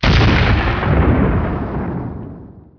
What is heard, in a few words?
GRENADE; Hidden & Dangerous; h&d demo